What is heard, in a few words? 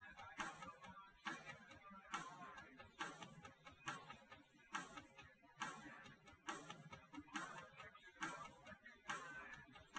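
with drums, live rec, 250ms, 5cm; SiSEC08; SiSEC underdetermined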